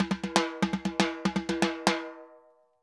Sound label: Timba Poly, Drums & Percussion; Best Service; Festa Latina VOL-1